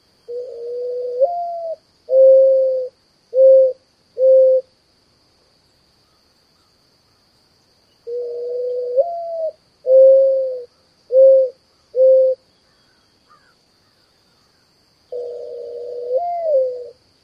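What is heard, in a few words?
coo and partial coo; NY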